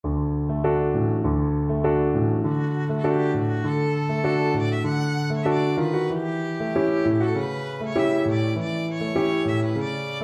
tango violin; albeniz

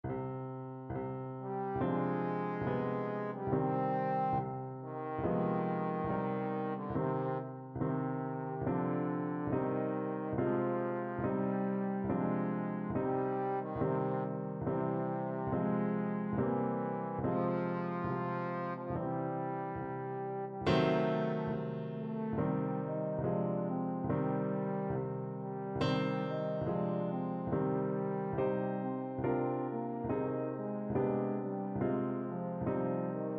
trombone

